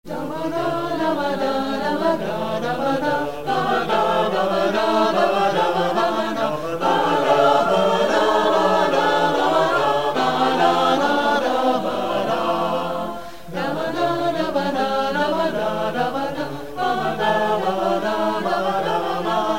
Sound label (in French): bourree